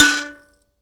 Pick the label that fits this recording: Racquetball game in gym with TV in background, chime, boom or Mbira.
Mbira